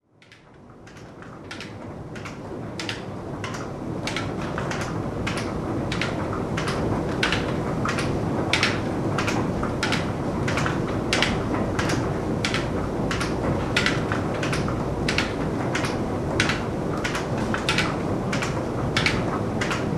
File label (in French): transmissions moulin; mz-n710; moulin de vaudrey